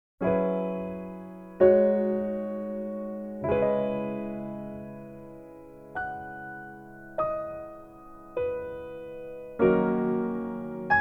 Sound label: Intro (Piano Version)